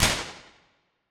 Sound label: Alley Stereo